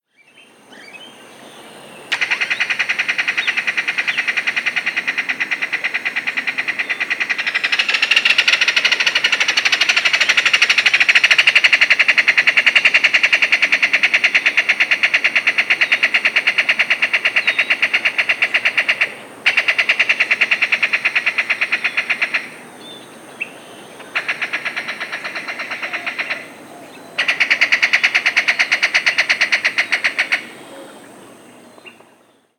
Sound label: ringed kingfisher call, bird song